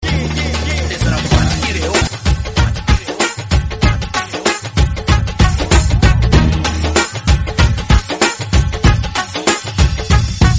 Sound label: Panjab Tone